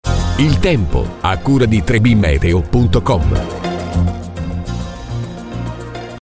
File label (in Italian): Meteo sigla